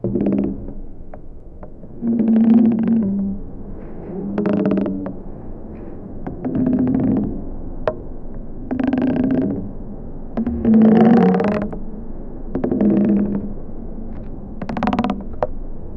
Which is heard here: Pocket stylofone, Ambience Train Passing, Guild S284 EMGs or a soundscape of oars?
a soundscape of oars